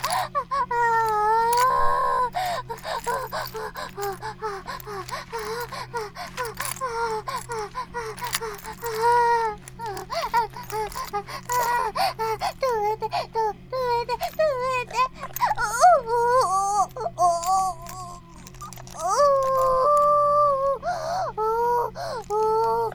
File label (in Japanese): バイブ放置; イクたびにわけて